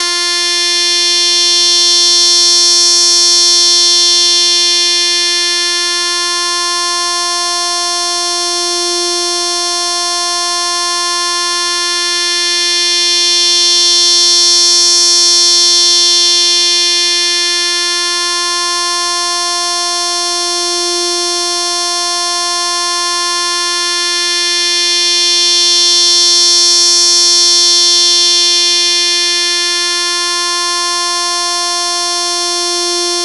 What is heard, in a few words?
tone